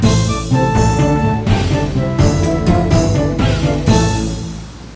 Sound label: siege start